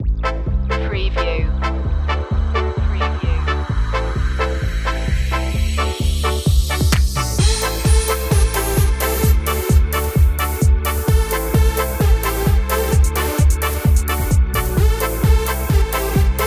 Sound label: energy ska